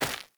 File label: Grunt Step Default, footsteps